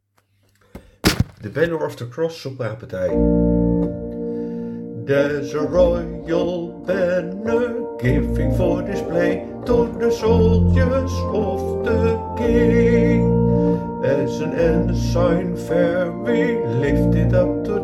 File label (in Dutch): sopraan; The banner of the cross